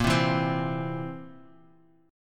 Bb Suspended 2nd Flat 5th Bb down; strummed

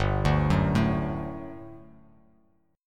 G Major 7th Suspended 2nd, Bass Standard; arpeggiated

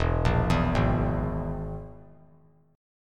E Major Flat 5th; arpeggiated; JGuitar

